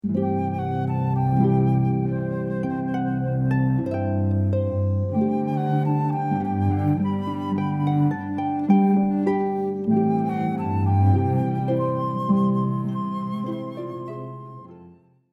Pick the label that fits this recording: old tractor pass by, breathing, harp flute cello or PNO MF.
harp flute cello